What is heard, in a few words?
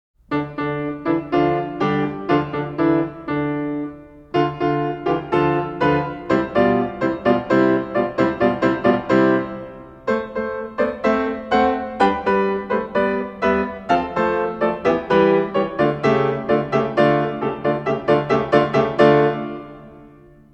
Rhythmic Dance; Magrath Book 04